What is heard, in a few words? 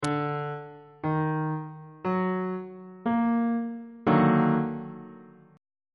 e flat minor major 7th 3rd inversion